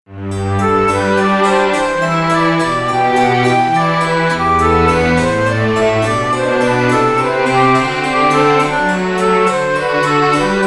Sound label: Classical Jesu Joy Of Mans Desiring